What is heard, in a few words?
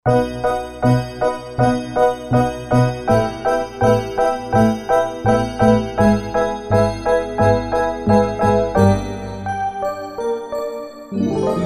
Voice & Bell, Korg Wavestation A-D